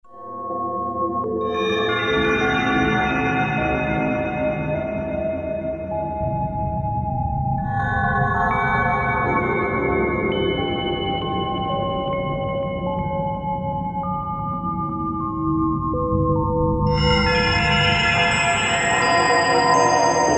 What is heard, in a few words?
Dream World, Korg 05RW